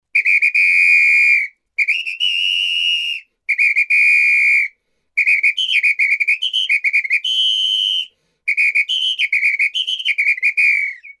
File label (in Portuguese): Apito